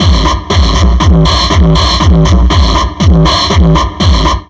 Drum Loops Crazy Processed

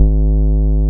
BAS MKS-50; Best Service Gigapack 2

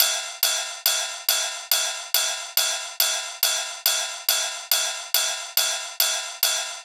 drum Loop 140BPM Ride

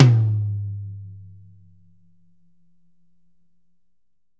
Drums CLOSE; Big Fish Audio; Ross Garfield Drums VOL-2; SHILOH KIT

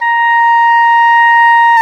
oboe, Oboe Brth

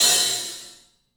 club hat; 3D Sound; 3D Drums

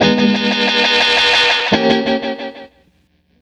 Dub Guitar; Electronic Point Blank

